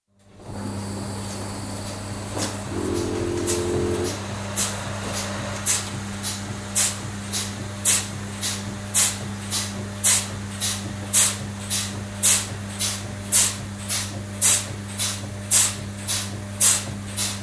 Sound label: Milking Parlour, interior, milking machine running, no animal noises, machine switched off (effect ends abruptly) (Close perspective recording); Cow Sound Effects; BBC